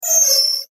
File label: Error Tone, Error Tone Multimedia; Web Interactive; Technology; Noise; Electronics